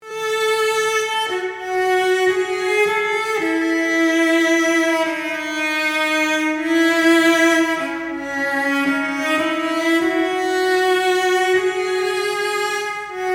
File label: lead viola, bar 37